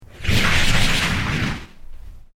Created Creature Medium Exclamaition, Alien Sound Effects; Created creatures, vocalisations and pretend speech